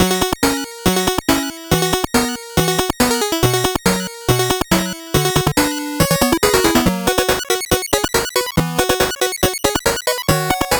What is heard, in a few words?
8bit bgm slow